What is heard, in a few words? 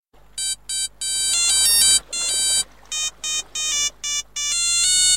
electronic